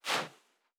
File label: Footstep Sand Walking; Character Sounds